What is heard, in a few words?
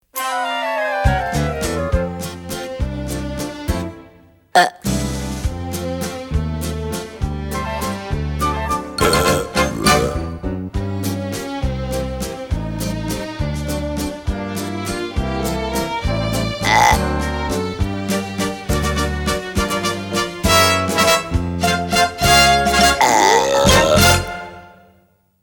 Burping Song; willywonka